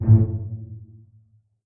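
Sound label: string pizzicato A1 pianissimo left; Sound On Sound - Peter Siedleczek's Orchestra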